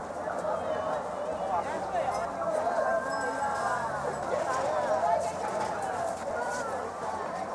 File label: ambi town; Mafie 1